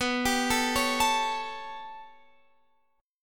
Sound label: B 7th Suspended 2nd Sharp 5th B down Guitar Standard; B7sus2#5 arpeggiated; chord shape; JGuitar